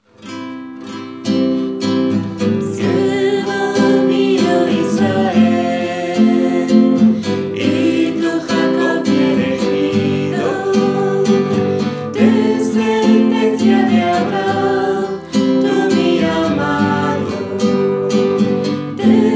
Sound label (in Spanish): Guitarra